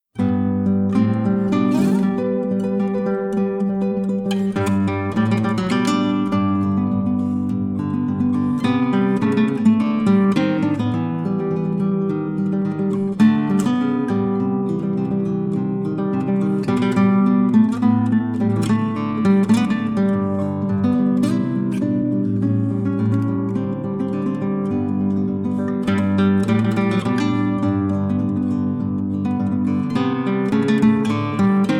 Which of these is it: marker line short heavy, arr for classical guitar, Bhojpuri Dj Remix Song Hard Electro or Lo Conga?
arr for classical guitar